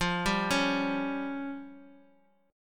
F Suspended 2nd Sharp 5th F down; Fsus2#5 arpeggiated

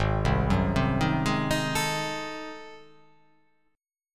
G sharp 7th Flat 9th G sharp down, chord

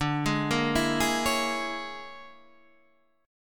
A sharp 7th Sharp 9th Flat 5th D

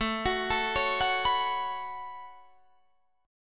A 6th Add 9th A down Guitar Open D

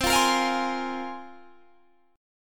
C 7th Suspended 4th Sharp 5th, Guitar, Drop D

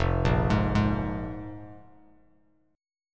F Minor Double Flat 5th, F, Bass Standard; Fmbb5 arpeggiated; JGuitar

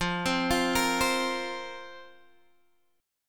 F Suspended 4th F, Guitar Standard; Fsus4 arpeggiated; x,8,10,10,11,8; JGuitar

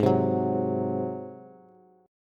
G sharp 7th G sharp, Bass; G#7; strummed; x,11,10,11; JGuitar

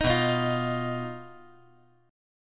B Major Flat 5th B, chord

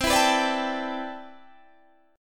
C Major 13th C, Guitar DADGAD

